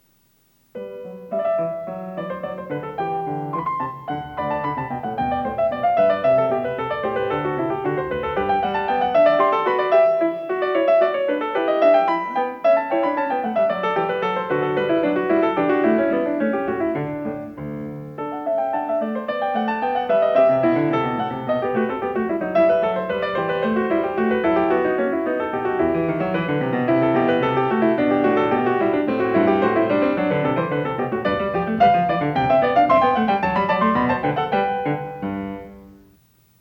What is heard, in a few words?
Goldberg Variations, BWV 988 Variatio 2 a 1 Clav; Johann Sebastian Bach